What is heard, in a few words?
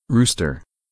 rooster, domestic animals